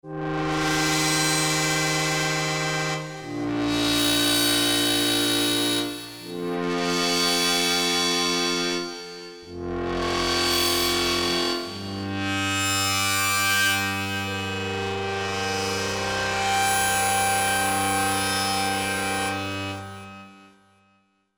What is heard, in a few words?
VORTEX, Korg MSC-11S Brass